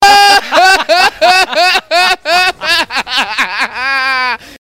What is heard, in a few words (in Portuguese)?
risada